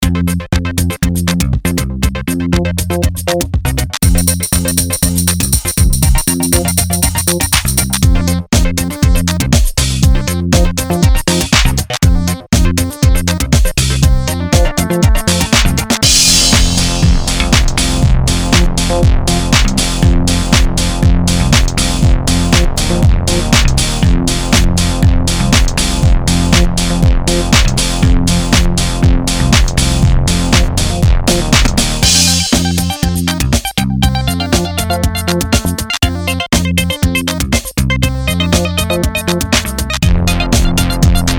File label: beat; bpm:120